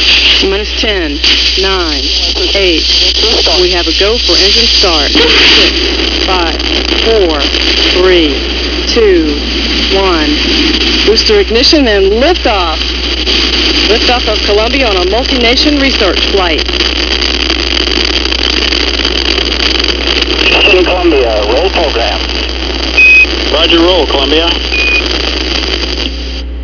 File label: lift off